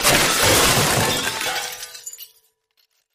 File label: Metal Wood Glass, mixed